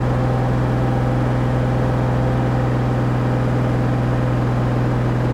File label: Truck Unimog ONBOARD Steady 2400 rpm EXHAUST; Mercedes-Benz Unimog; 437. U2450 4x4; MKH8040; Dramatic Cat